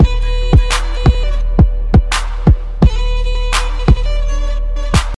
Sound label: Instrumental